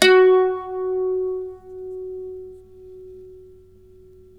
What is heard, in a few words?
f+3, Mandolin; Terkelsen